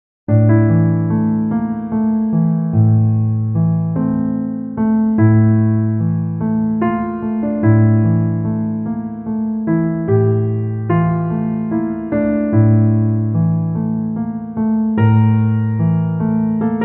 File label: Piano